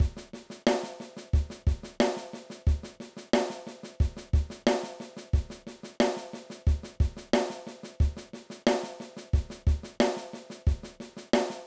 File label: Intermediate Drum Beats